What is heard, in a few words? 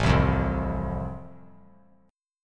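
A Minor Sharp 5th, chord strum; Am#5 strummed; JGuitar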